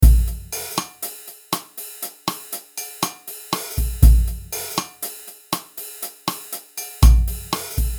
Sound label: Jazz 120BPM